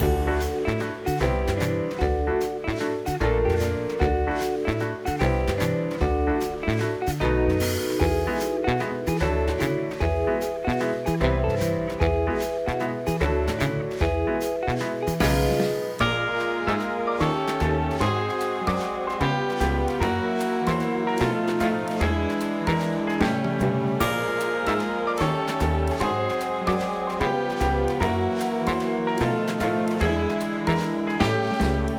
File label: Menu Layer